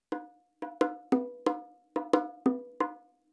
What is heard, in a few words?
Bongo cafe con pan